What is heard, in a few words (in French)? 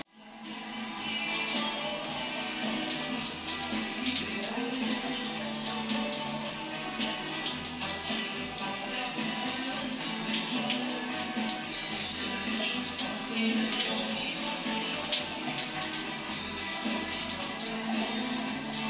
douche; bandar abbass